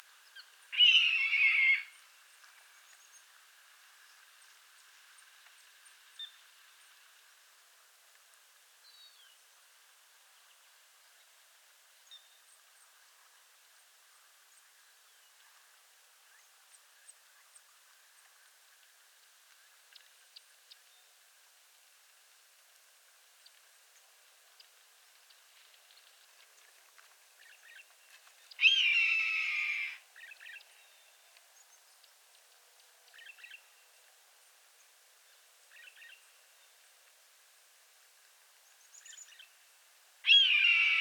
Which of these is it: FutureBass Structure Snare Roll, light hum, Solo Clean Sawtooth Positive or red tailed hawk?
red tailed hawk